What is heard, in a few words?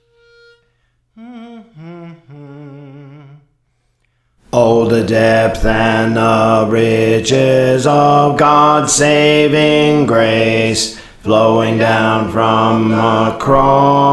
Bass, Songs; Praise For The Lord Training